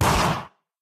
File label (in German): waffen schuss; minecraft